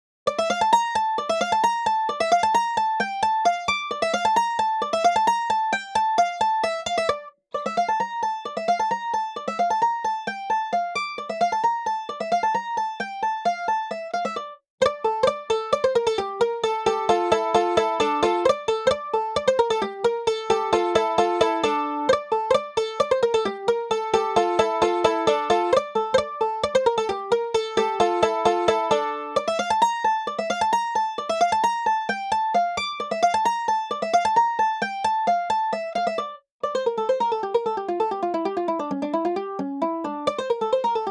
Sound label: Mandoline; Evolution Mellow Mando von Orange Tree Samples